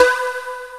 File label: BARREL, K1 KAWAI; East West; Global Sounds